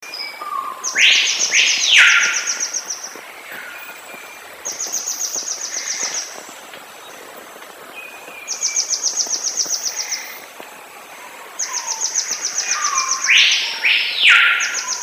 canto do balanca rabo paraense

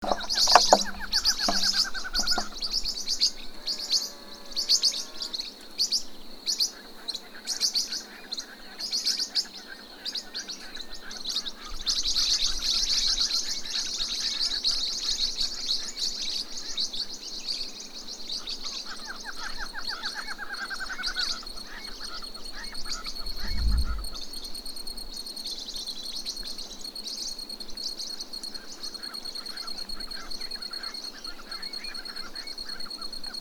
tuim canto

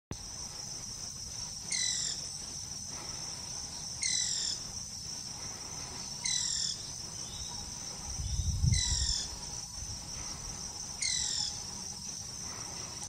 Canto do maria fiteira

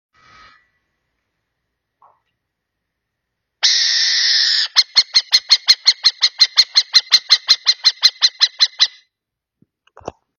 canto da coruja

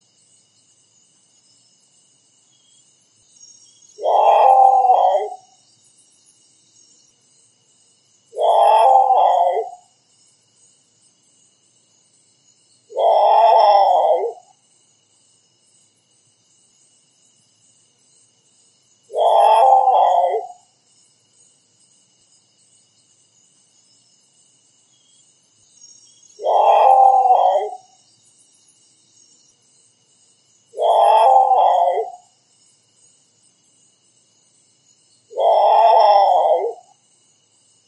Canto do urutau grande

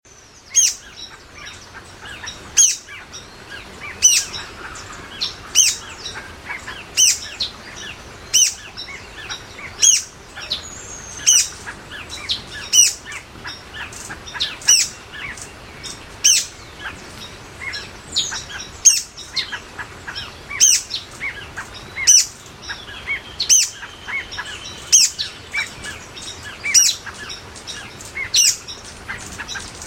canto do trepador quiete

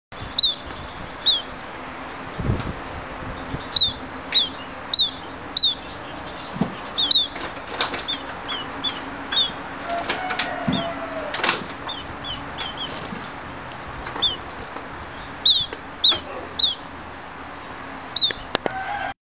canto do capuchinho grande

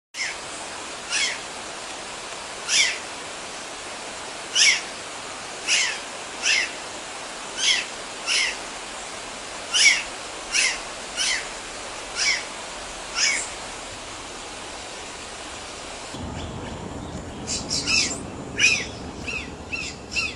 canto da gralha azul

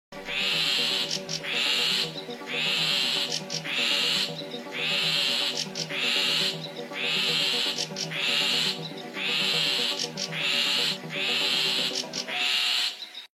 canto do picanco de cauda longa

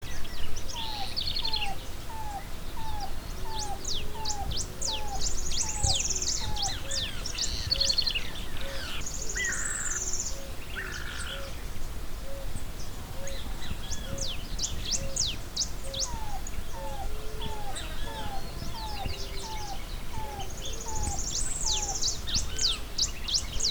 canto do papa lagarta cinzento

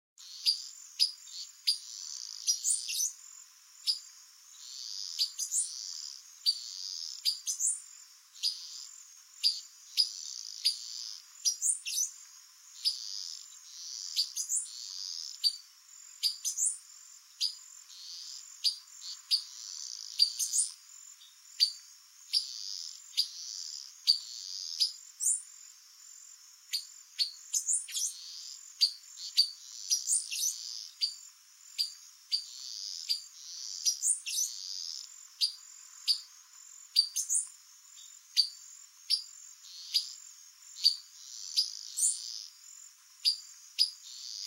Canto do pipira vermelha

